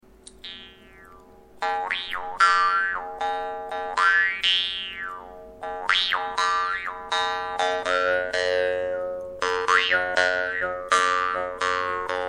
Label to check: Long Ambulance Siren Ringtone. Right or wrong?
Wrong